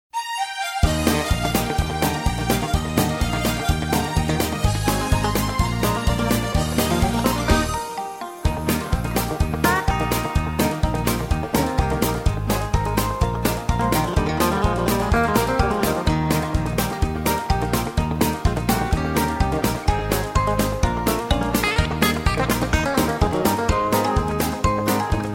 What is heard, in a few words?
music